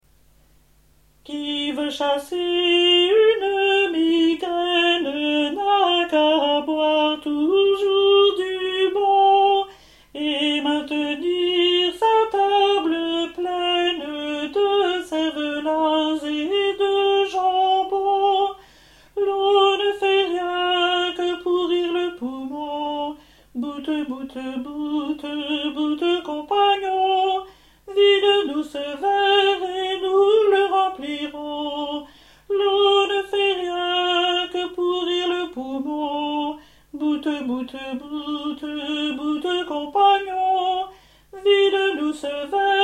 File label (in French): qui veut chasser une migraine alti